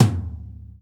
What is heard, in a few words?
tom; foxdot default